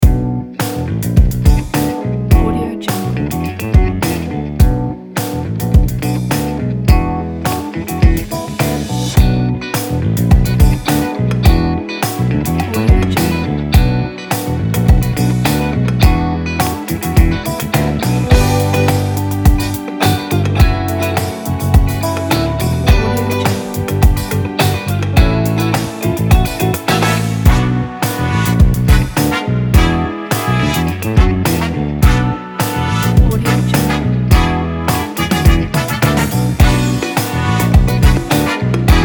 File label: big city energetic funk